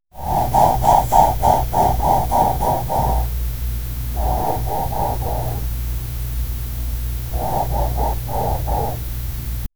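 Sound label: a panther slowly breathing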